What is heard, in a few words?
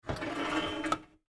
Toaster Oven Door, Toaster Oven Door Open; Appliance; Household; Blastwave